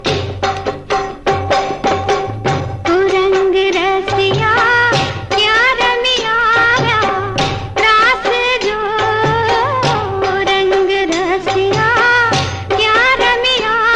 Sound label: Gujarati Ringtone